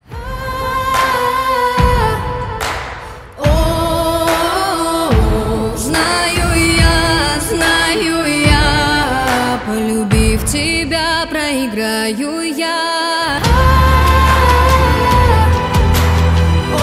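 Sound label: russian cover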